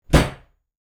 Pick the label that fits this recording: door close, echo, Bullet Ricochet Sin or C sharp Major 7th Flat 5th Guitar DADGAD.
door close